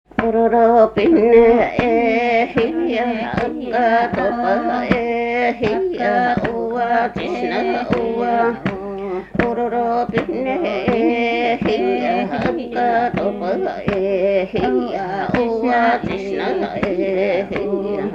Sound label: ainus, polyphony